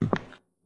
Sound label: footstep; Ludum Dare 46; Random footsteps